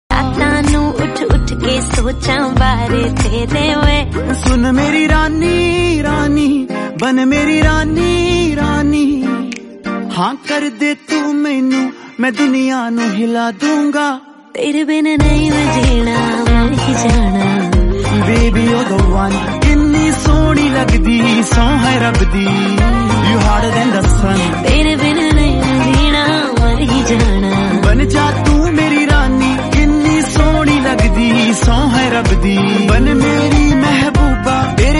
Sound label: romantic mushup ringtone